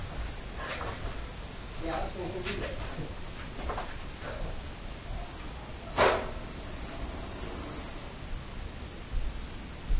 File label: Basement Unexplained breath, noise and breath again Amplified; Truner Home